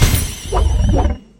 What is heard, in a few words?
chest coin landed; lost relics